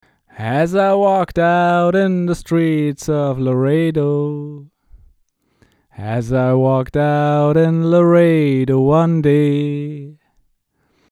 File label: Vocals SM7B Zoom L 12 Comp